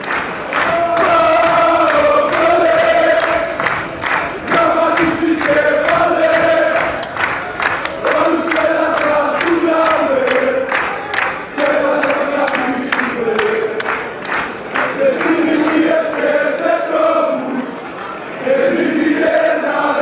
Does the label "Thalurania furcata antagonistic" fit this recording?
No